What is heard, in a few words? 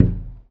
window thump; glass, hit, hitting, kick, knock, muffled, pane, pound, resonant, thump, window